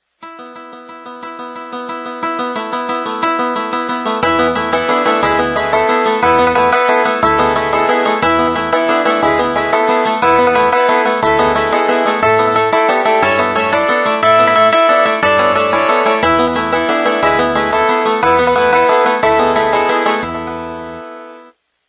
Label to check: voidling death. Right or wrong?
Wrong